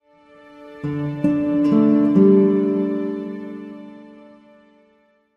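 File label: Music Logo Classical Guitar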